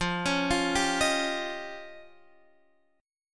F 7th Suspended 2nd Sharp 5th, Guitar, Half Step Down; F7sus2#5